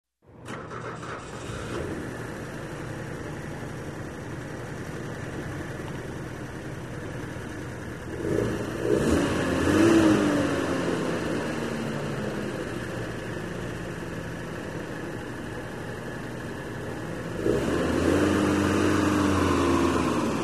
Truck Engine ignition and driving away

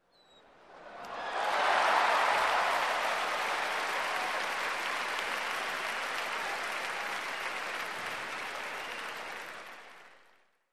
Amazed Crowd and Applause

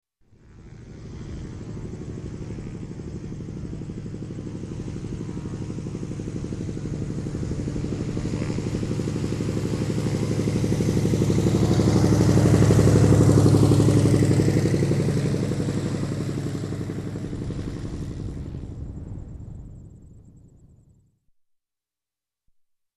Propeller Aircraft taxi passing by

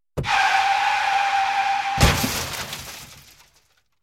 Car Squeaky Brakes and Bumps

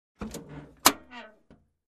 Opening compartment with handle

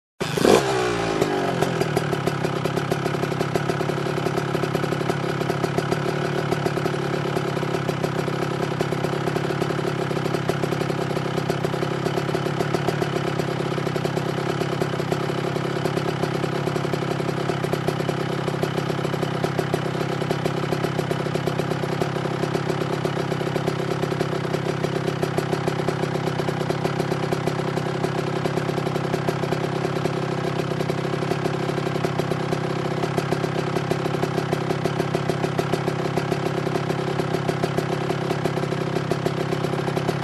Offroad Motorbike engine sound

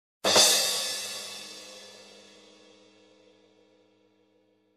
Two crash hits variant

